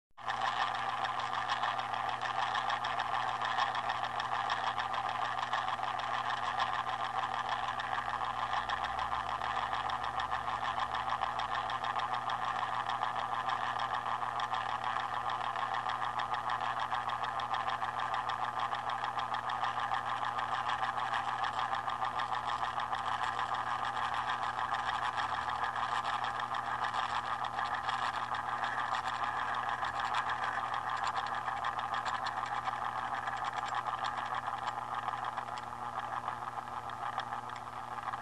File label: Clock gear roll